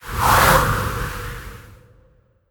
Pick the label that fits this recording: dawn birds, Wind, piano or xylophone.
Wind